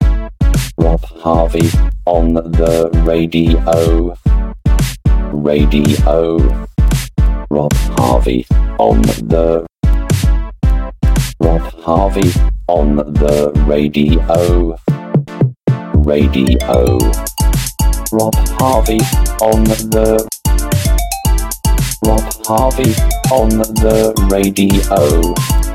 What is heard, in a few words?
Jingle; arama songo